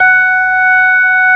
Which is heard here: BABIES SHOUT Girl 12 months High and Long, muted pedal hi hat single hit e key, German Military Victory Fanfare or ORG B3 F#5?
ORG B3 F#5